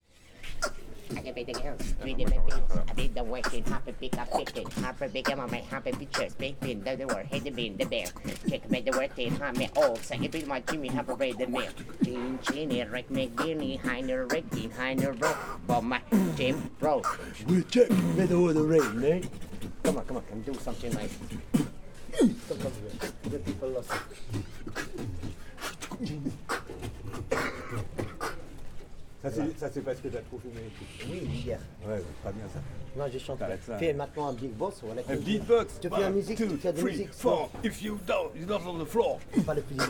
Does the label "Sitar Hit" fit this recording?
No